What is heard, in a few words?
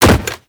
land soft default, footsteps; Enemies; Warframe; Teshin